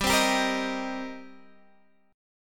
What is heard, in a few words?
D sharp 6th, G